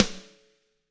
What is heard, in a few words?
Snare Crack